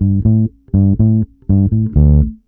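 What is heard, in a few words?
ONE BASSE, 122 bpm; USB Soundscan vol.32; Roots Disco; AKAI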